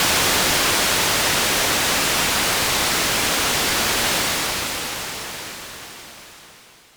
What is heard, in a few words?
Juno 60 Raw, White Noise Raw; noise hiss crackle